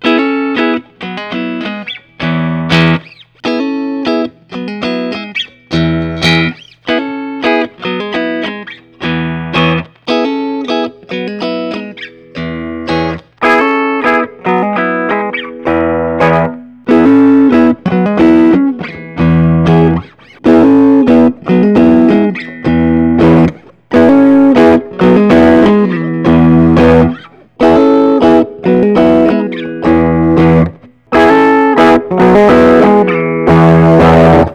james tyler studio elite hd rasta

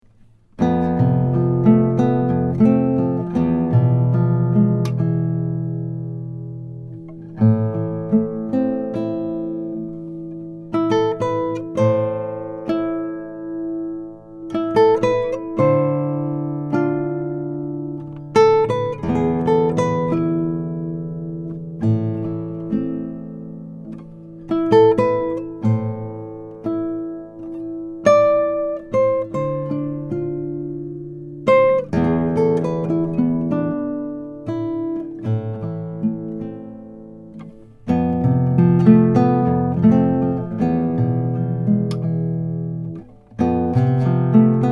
kenny hill torres fe 18 brazilian rosewood

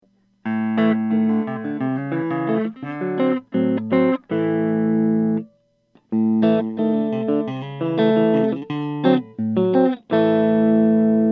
brondel honeycaster dc flame redwood top

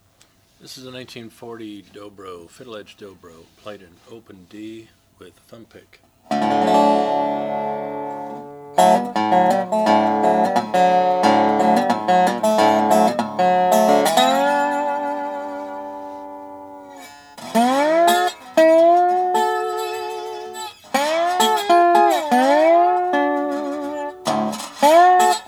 dobro m35 sunburst slide

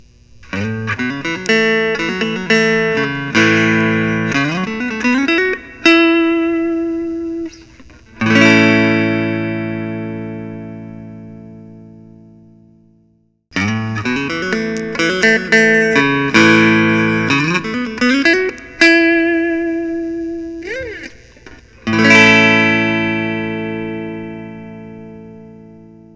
fender telecaster blonde

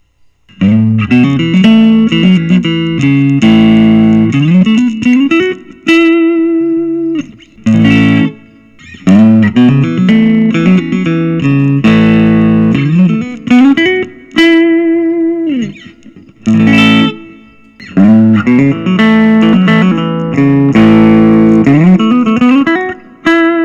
nik huber krautster ii gold top

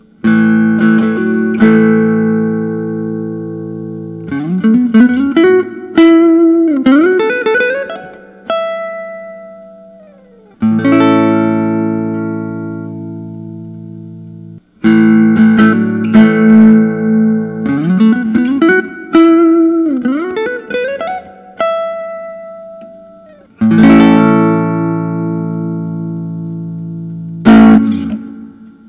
gibson kalamazoo es 175 restored natural

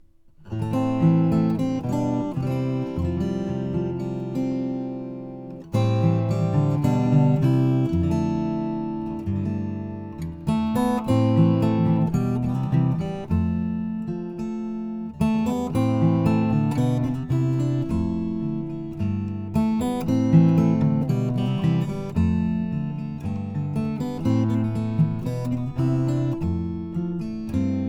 mcpherson sable carbon fiber

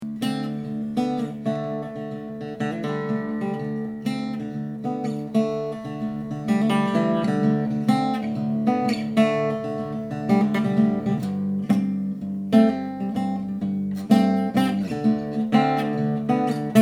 the loar lh 309; vs sunburst

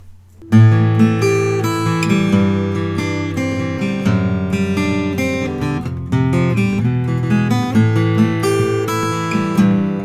collings 001 mh 12 fret

